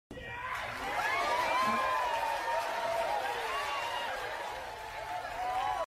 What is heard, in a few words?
yes with applause; YESSSSS COM APLAUSOS